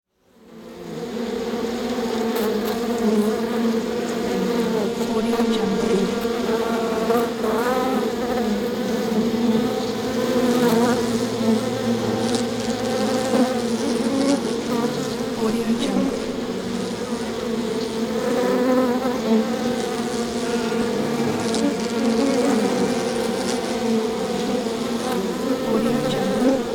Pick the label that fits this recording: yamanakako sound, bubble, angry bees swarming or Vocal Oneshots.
angry bees swarming